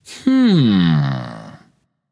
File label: thinking; smite; achilles